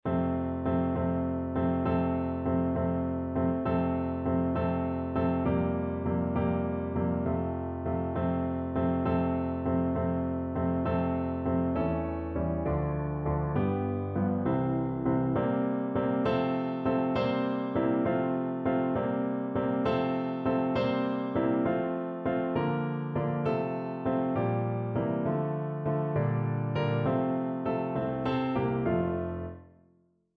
Sixths Low Voice accompaniment; Grade 5

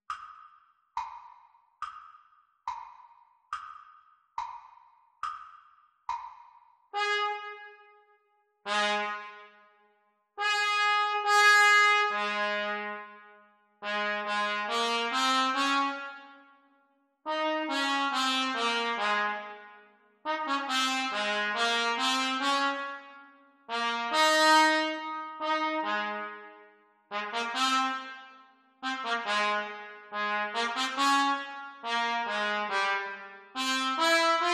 Trumpet Duets; Grade 5; Practice Speed

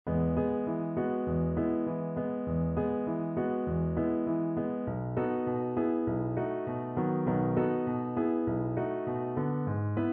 Syncopations Low Voice accompaniment; Grade 5

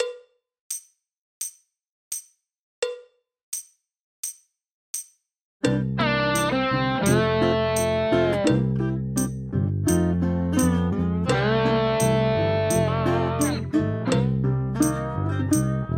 Drum Kit Playalong; Grade Two; backing tracks; Drums

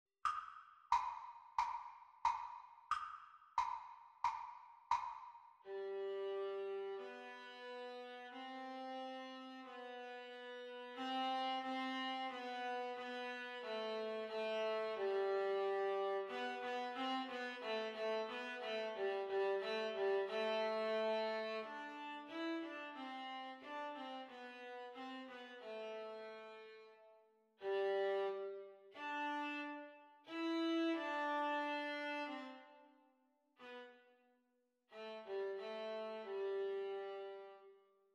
Viola Duet Playalong Exam Speed

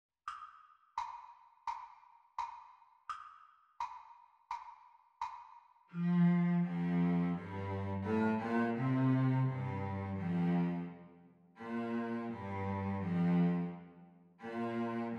Cello Duet Playalong; Exam Speed; The Teacher part

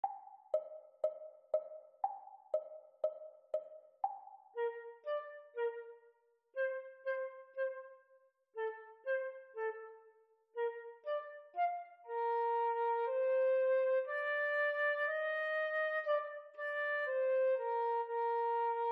Flute Duet; Exam Speed; Grade 4; Devienne

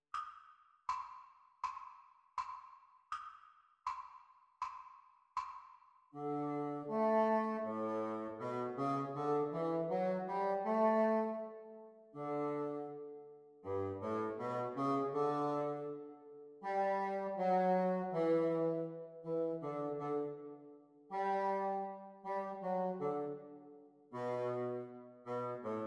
Bassoon Duets; Practice speed

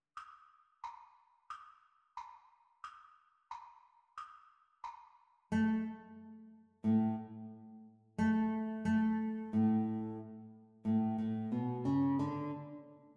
Guitar Duet Playalong Exam Speed

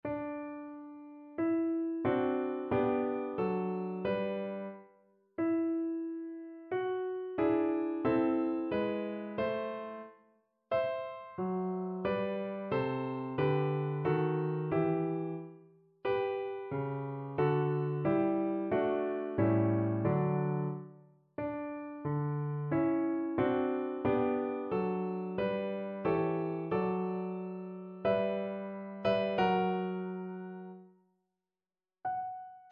scales high voice accompaniment; MTB Singing Vaccai; Grade 4

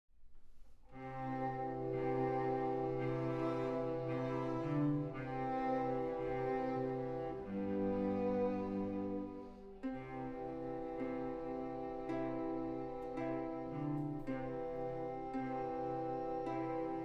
Strolling Along with Ukulele

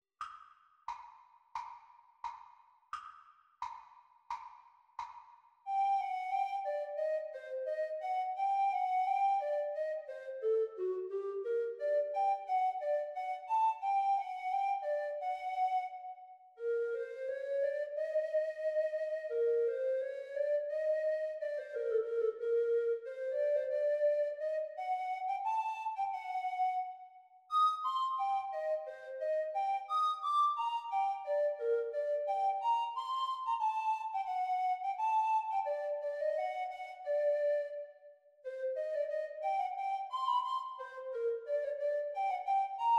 Treble Recorder Performance speed; Grade 2

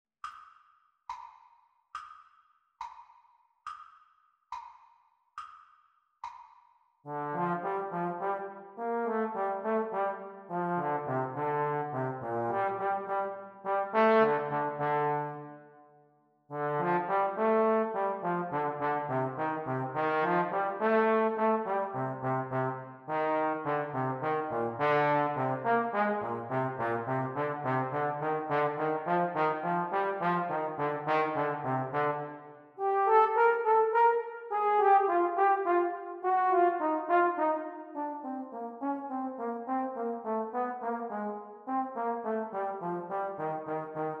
Playalong for Eb Horn Practice Speed

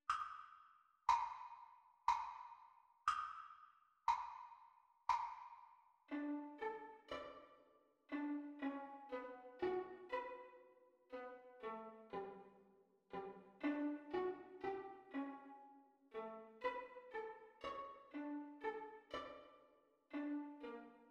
Viola Duet Playalong Practice Speed

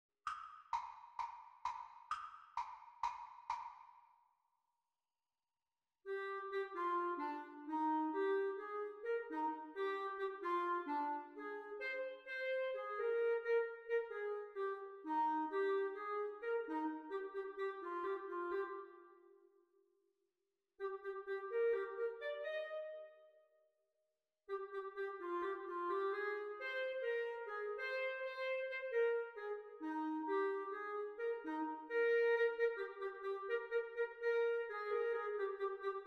Exam Recording Clarinet Duets; Exam Speed; Grade 4; The Teacher part

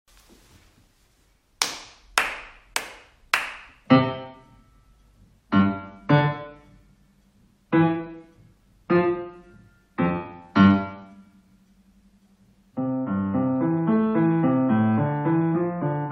Piano Duet; Grade 1; exam speed; teacher parts

